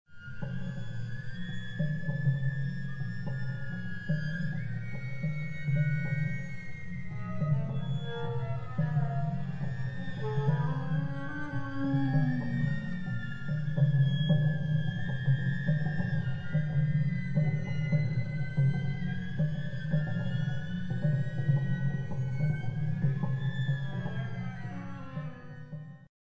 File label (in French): gliss